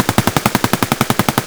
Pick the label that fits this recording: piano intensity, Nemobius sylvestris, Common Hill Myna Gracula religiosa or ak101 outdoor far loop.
ak101 outdoor far loop